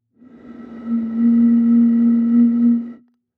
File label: Bottle Blown